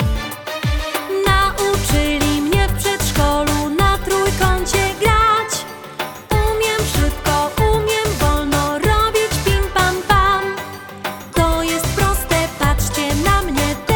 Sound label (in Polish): na instrumentach gram